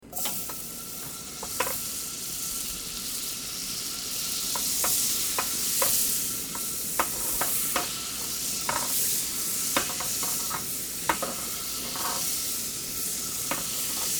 butter cooking

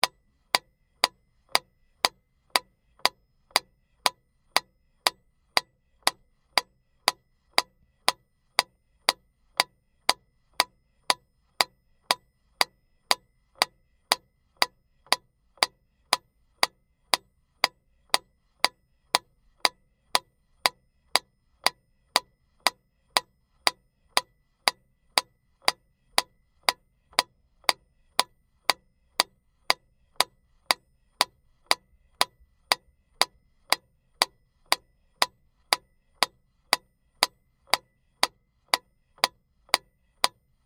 metronome

